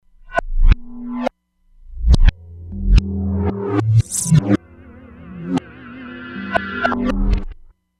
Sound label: Reverse Drums, E-mu Proteus-3; Factory patches